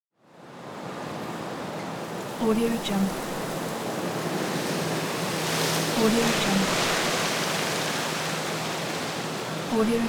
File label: wind tree leaves rustle